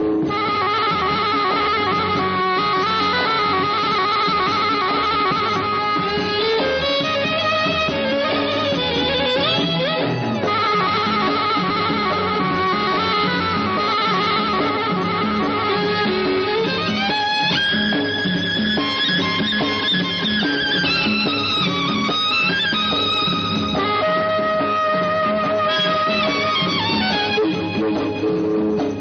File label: egyptian music